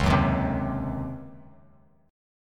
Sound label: C sharp Major 7th Suspended 2nd Suspended 4th Bass Standard; C#M7sus2sus4 strummed; JGuitar